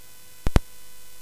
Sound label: Space Gun